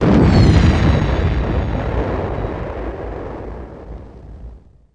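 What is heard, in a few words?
combat explode a wing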